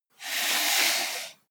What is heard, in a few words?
computer mouse draw long